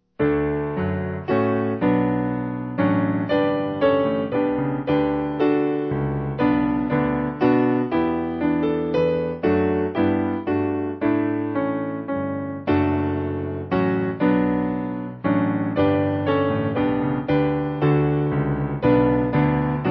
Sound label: D Piano